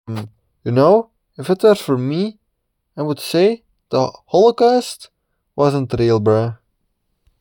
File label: screaming; steve jobs